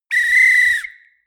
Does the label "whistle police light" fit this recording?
Yes